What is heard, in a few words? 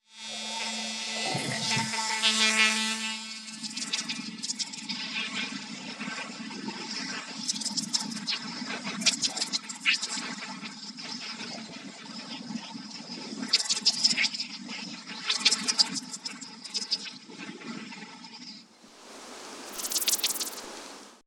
Hybrid Insect; Pure Data